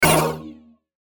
BUTTON HIT DIGITAL

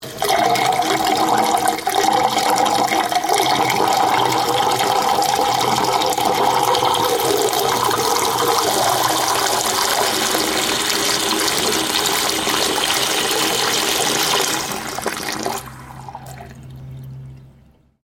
Water Running Down Kitchen Sink; Household

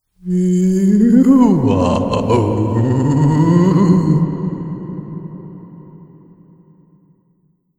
Camp Ghost In Reverse; Ghost Sound Effect - Sinister, Scary, Spooky Or Just Silly; Haunted House Or Graveyard On Halloween

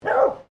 ANIMAL DOG BEAGLE FEMALE BARK; Various domestic dogs; Animals

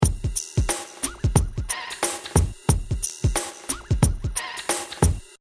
Hip Hop Drum Loop 90 Bpm Screechy Swing; LYN118 pack of Hip-Hop 90 BPM loop; Drum Loops

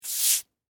Household, Cleaning, Brush, Scrub; BlastWave

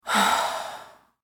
Human Female Sigh; Sighing (Sound Effects:Voice)

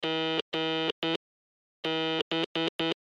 Morse Code Gb Good Bye; Blastwave

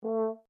HORN A4 MP SHORT, French Horn in F; Alexander French Horn in F; Horns; Musical

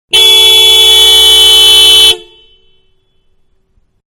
Small Car Horn Long Exterior; Vehicles; Manhattan Production Music

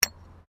Digging Small Handheld Tool Rocks; Various digging tools and processes; Construction

